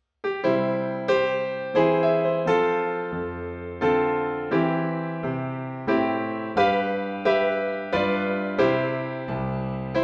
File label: Piano